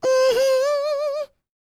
MMM, Vocal Blues Soul; Spectrasonics; Planet

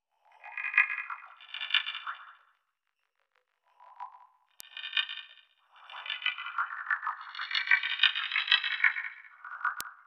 Tirks Plate; Sonic Foundry (Sony Creative Software) - Future Retro Dance Excursions

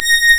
Synclav FM STRINGS A#; Big Fish Audio

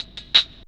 LOOP, Drum & Bass, 174 BPM; Ueberschall; Drum & Bass Flash Trackz

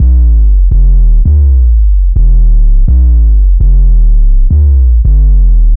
Hard Sweep Down, Bass Lines 166.5; Zero-G Jungle Warfare 1, 2 & 3; 90 s Sample CDs

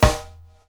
TING SNAR; AMG Gota Yashiki Groove Activator; TING LOOP 74

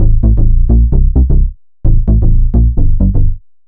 Bass, uk garage, 130bpm; Zona

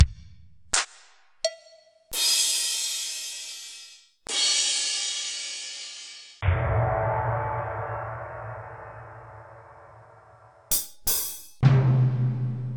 Kurzweil K2000

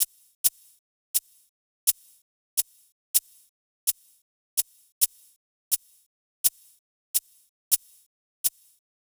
Shake 105, Percussion Elements

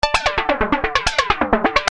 Arpeggio 130 A, Techno Synth Loops; 130bpm; Sonic Foundry (Sony Creative Software); Signals I

